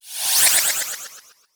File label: spacey swish spin